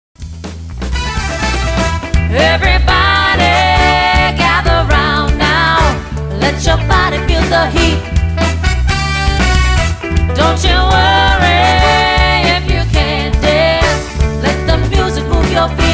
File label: conga